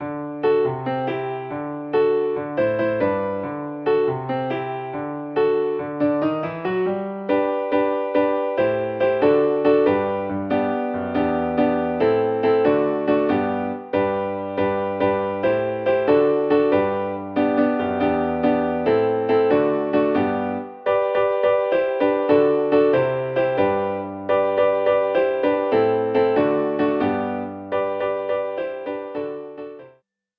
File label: accomp